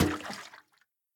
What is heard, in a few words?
gasoline drop; Devour 2021 gamerip pc macos